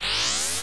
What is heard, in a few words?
teleport out